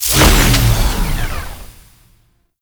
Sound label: Chain Reaction End; Warframe; Qorvex